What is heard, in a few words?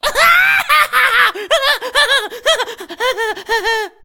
laugh; Ziggs; 吉格斯; 英雄联盟; 爆破鬼才